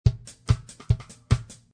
Ska Drum; Lounge & Reggae; Loopology; Ska Till Ya Drah

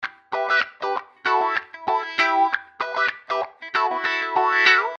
Smack Funk Wah; Funk & Rock; Loopology; Get Dirty

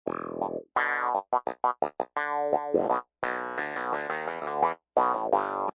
Wah Clav; Funk & Rock; Loopology; Get Dirty

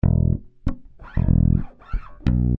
Smack Funk Fretless; Funk & Rock; Loopology; Get Dirty

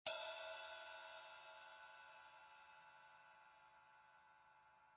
Ride Cymbal; Loopology

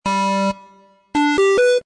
Tone Gen G, Techno & Dance; Loopology; Pumpin

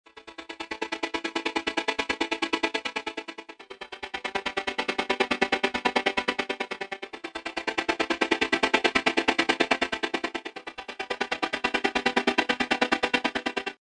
Chamberlain String Stutter A; Loopology; Crying & Dancing; Techno & Dance

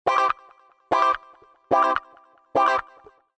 Tech Funk Wah A min; Loopology; Pumpin; Techno & Dance

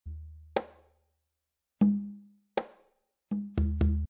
Congas, Bossa Nova; Surround Session

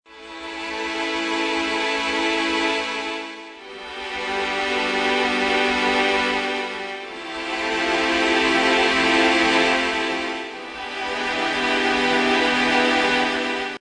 Chamberlain String A; Loopology; Crying & Dancing; Techno & Dance

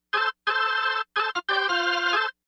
B3 Leslie Lead F, Lounge Reggae; Loopology; Ska Till Ya Drah

